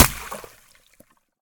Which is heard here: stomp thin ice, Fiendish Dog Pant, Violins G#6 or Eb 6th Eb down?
stomp thin ice